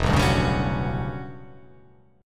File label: E Minor 13th chord